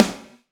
Snare

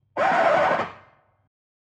Dj Disc Stop

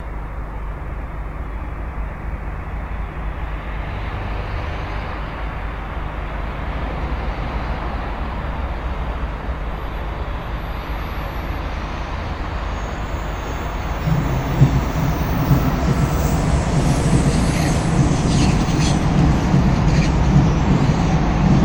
Street Noise Passing Train

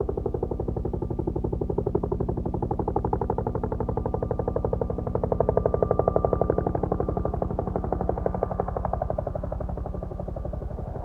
Iroquois Huey Distant Sound And Arrival

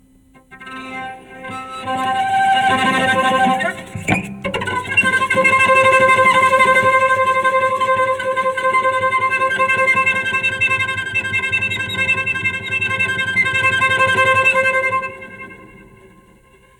Cello Play C

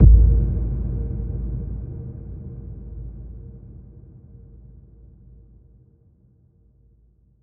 Deep Impact

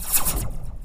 Laser Short

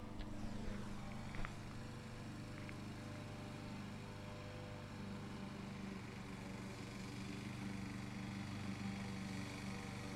Lawnmower

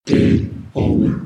Gameover

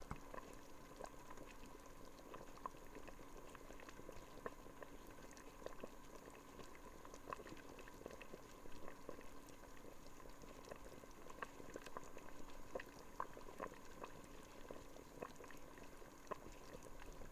Soup Boil

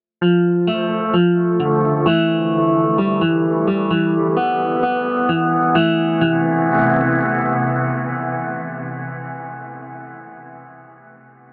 Jazz Guitar Sort Sample Loop

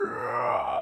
Zombie

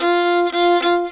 Violin Bit

